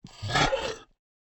dinosaur rumbling breath; SoundsCrate

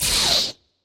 lightsword blaster block; soundscrate

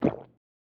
gulp; soundscrate

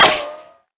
ricochet; SoundsCrate

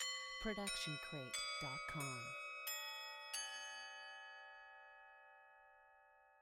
School Bell Ident; SoundsCrate

